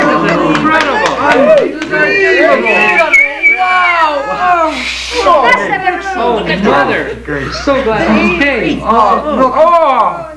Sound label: Cheering Small Crowd; Soundsation; Human